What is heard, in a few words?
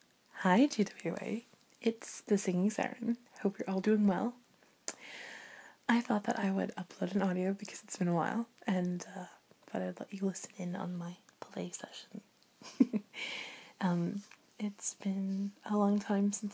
[moaning][breathing]; fap fap fap; magic wand; nippleplay; ramble; sighs; all kinds of sounds; F4A